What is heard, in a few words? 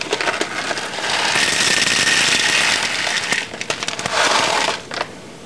beans pour, coffee